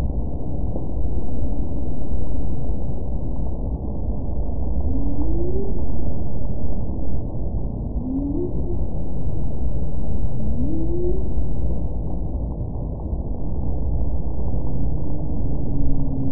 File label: humpback whale